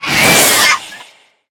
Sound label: scream, mob endermen